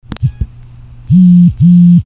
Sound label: vibrate